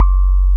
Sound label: TINE; Wizoo - Powered DX; DIGIJAZZ